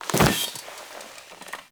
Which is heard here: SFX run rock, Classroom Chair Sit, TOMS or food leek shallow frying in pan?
Classroom Chair Sit